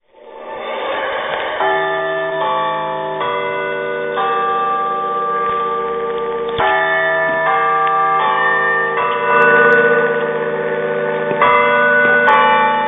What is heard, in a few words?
DK 243kHz